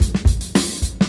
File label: Boombap drum loop